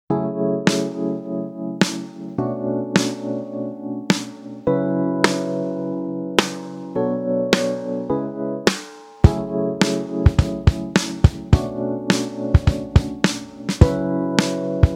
drum snare

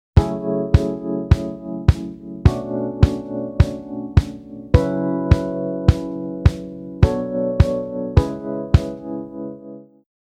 drum kick

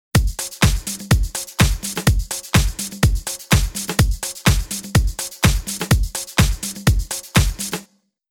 drum house; ハウスミュージック; ダンスミュージックの王道にして覇道といえばハウスミュージック（個人の意見）。

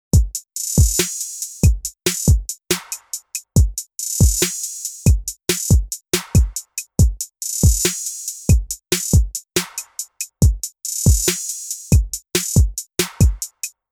drum hiphop trap

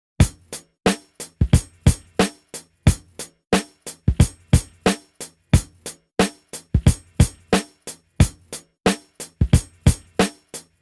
drum hiphop

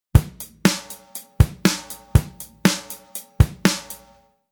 drum 8beat sample

